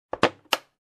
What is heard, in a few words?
Aircraft Overhead Bin Closed